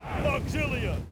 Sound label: bark; rome total war